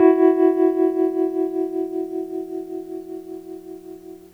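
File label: EP vib E3 left; E-MU Formula 4000 Series Vol. 1; Hip Hop Nation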